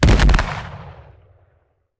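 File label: UAV volley, impact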